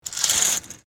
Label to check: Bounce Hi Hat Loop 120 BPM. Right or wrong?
Wrong